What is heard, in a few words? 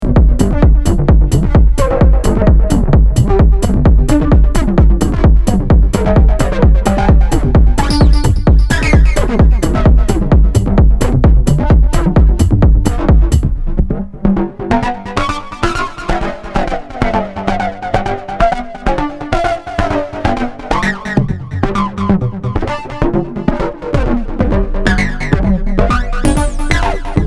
Trance